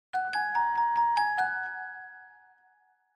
Clavichord Chinese

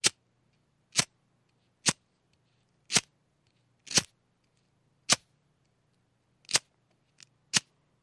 Bic Lighter multiple, lighters & matches

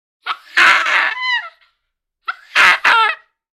Baboon Scream Two

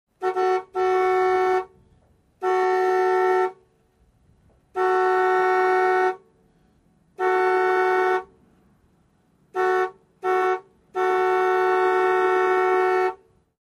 Car Horn Honk Medium

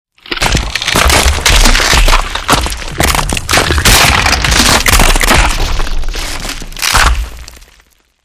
Rocks Multiple Larg, earthquakes

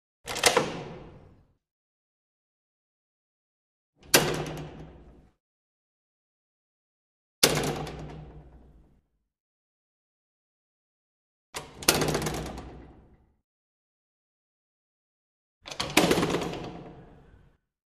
Door Metal Lattice Open, Close, Slam x5

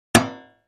Aluminum Gutters

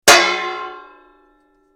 Frying Pan Or Pot Clan; pots & pans

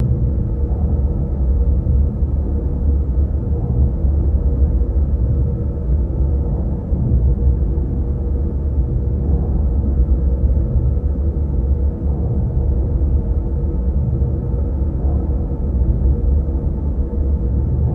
Bird Prey Synth Turb, spaceship fx

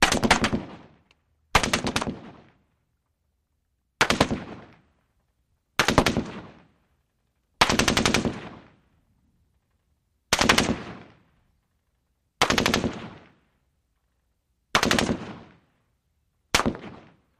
M-16: Rapid Fire With Impacts. Stereo

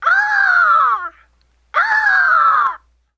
Eagle Scream Caw X2

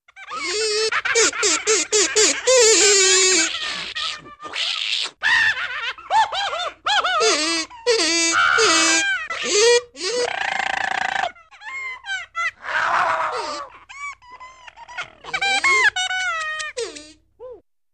Screwy Animal Vox, animal voice

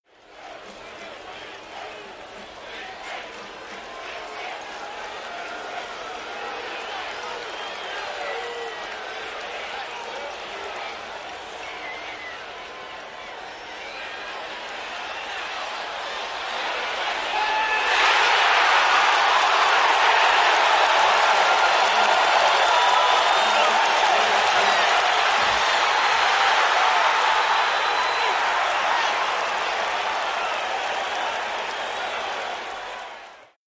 Football Crowd Defe, sports arena football

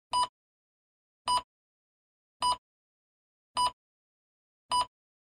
Computer Beeps, beeps & tones

